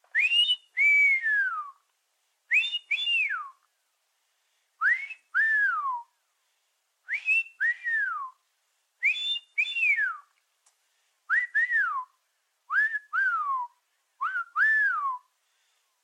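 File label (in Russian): волчий свист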